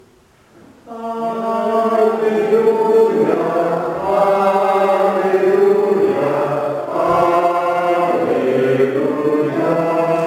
ALLELUYA

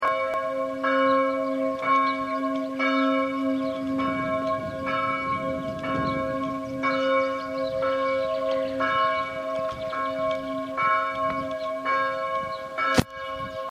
ANGELUS MIDI; CHERVES 86

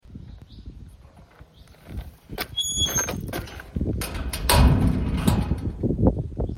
PORTAIL, BRUITS DE PORTAILS; CHIZE 79

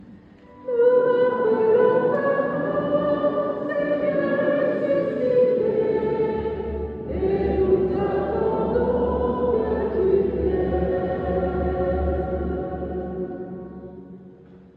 MESSE CHANT ANAMNESE; CHEF BOUTONNE 79; EGLISE NOTRE DAME FETE PAROISSIALE

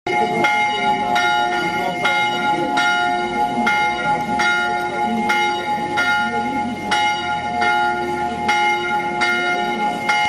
CLOCHE; LUSSERAY

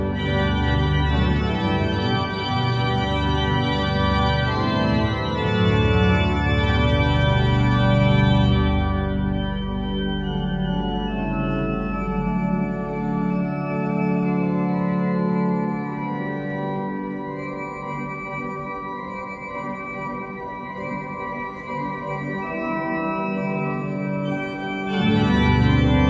ORGUE son; CELLES SUR BELLE 79

